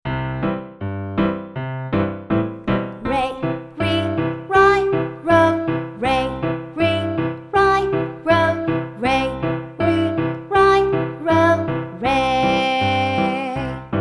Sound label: Vocal Warm Ups Ray Ree Ri Ro; Vocal Warm Ups Volume 2